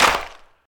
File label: wet hand clap a sharp key, Loudest frequency: 2296Hz; single hits one shots